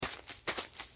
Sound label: footsteps; hello neighbor